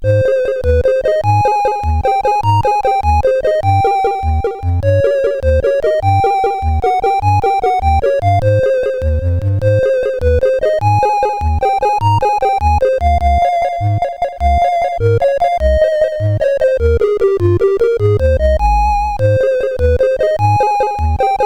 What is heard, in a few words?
sid, CSG8580R5; Gunfright